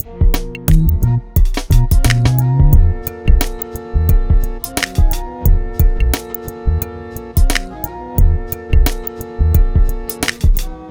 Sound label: Jazz; Sound Effect Library; Hip-Hop